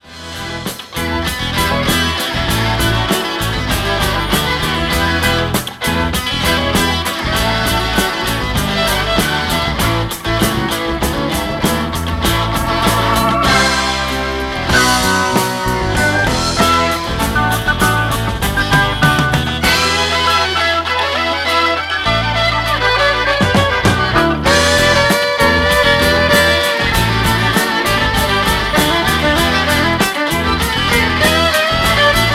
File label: vinyl restoration rock